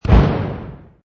Explosion Small, Short explo, good stereo definition; Bombs; Lynne Music